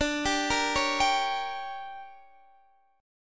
D Major 7th Suspended 4th Sharp 5th, Guitar Open D